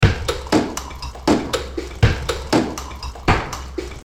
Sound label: Beats